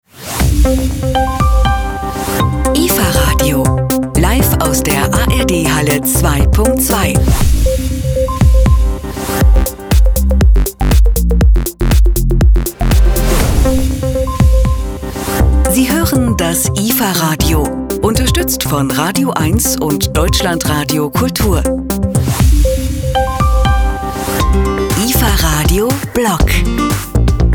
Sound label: JINGLES; IFA 2014